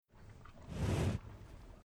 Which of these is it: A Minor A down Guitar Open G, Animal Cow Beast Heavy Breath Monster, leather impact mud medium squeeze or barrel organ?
Animal Cow Beast Heavy Breath Monster